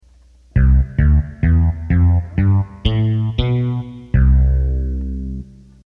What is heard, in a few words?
Fretless Bass